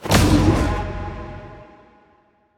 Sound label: activate; Smite; Baron Samedi